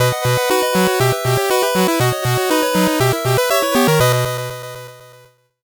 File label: 8bit jingle slow